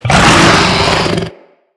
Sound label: Infested Ancient Attack Grunt; Enemies